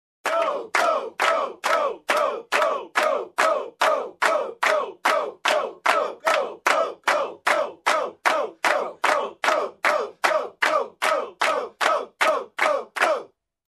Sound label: Crowd encouraging and clapping